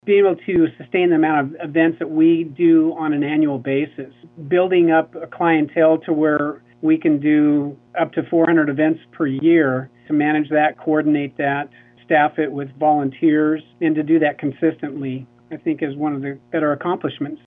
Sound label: rhodes